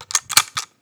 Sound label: Inf Weapons Foley; Red Orchestra Ostfront 41-45